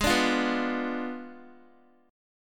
Ab Diminished 7th Ab, Guitar, 4E, 4A, 5D, 5G, 5C, 6F; Abdim7; strummed; x,11,12,10,11,x